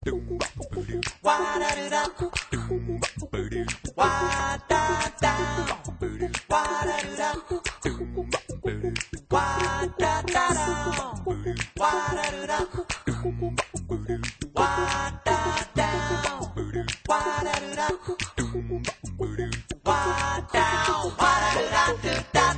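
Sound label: Music Ambient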